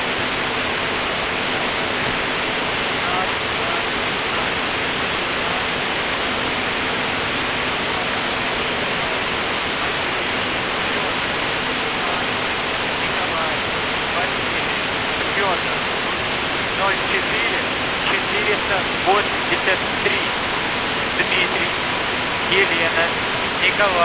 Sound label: buzzer usb msg